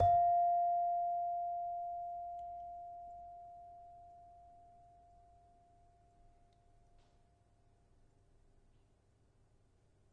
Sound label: Saron, Indonesian; Multisampled; WORLD